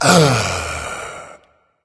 content berserker; cuchulainn

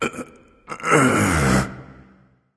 lift grunt; smite; ahpuch

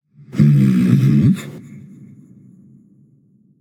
thinking; smite; voicepack; hachiman

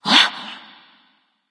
surprised; smite

